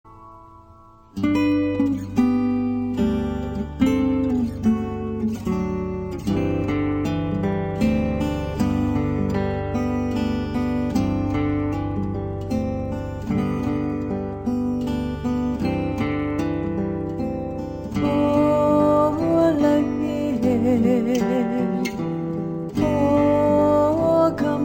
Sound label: Hawaiian Slack Key Guitar, Po Lai E (Silent Night